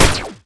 50kvolt fire

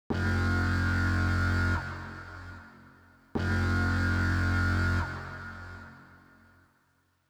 rocket alarm close

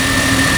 gunspin; giant heavy; brassbeast

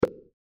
limbs pop; mvm forever

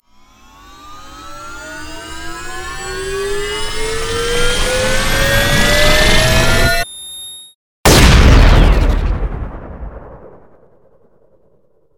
laser kaboom; bfg

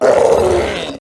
brute dead; trespasser

